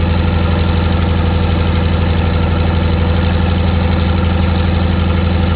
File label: Focke Wulf 190 Internal, Aircraft; combatfs